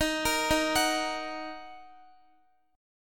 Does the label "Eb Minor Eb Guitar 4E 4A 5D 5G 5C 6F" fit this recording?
Yes